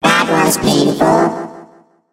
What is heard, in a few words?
hurt vo; evil rick